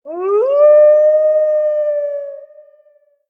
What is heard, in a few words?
Snow Wolf Howl